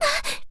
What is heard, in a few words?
die; Eternal Return; Tia; ENG